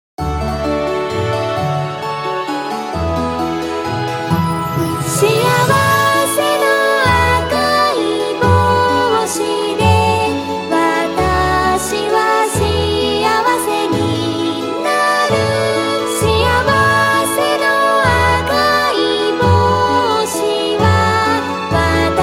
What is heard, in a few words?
japanese song